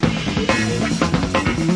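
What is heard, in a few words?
136 groove, sampled music loops; MELODIC LOOPS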